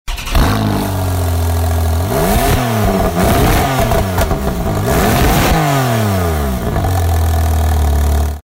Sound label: Porsche Targa